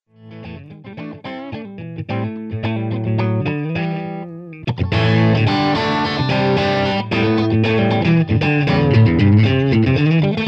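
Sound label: Tube Booster Mixdown, guitar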